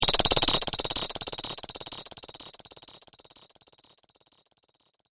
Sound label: Rattle That Snake, snake sound effect; Snake Sound Effects; animal